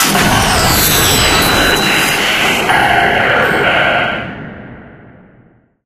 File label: dinosaur fizzle, ambient; video games; source engine; portal2